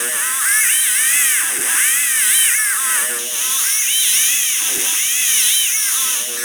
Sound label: STRETCHER, Human; Distored Reality